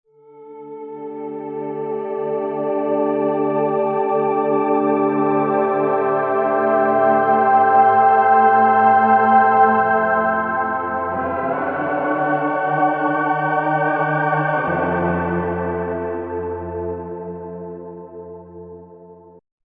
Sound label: Hollow D-50, Roland V-Synth; Factory Patches